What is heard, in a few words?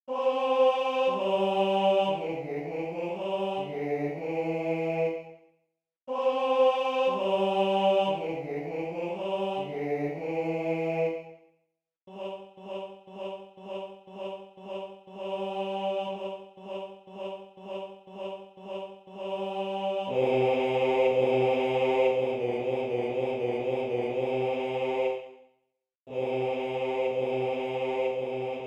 Bass II; Bacchus